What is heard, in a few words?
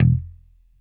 6STR BASS; Sampleheads; Whole Lotta Country 1 & 2